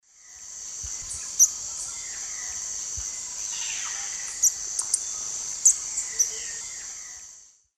Tangara callophrys, calls, S. America, Eastern Lowlands